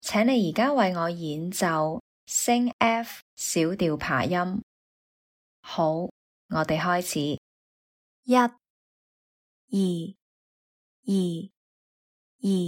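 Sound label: F sharp minor arpeggio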